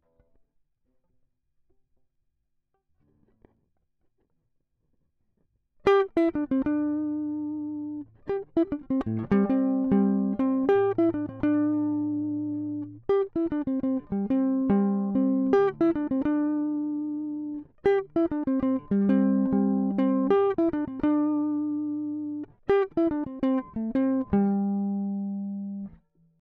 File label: Small Bright Combo; logic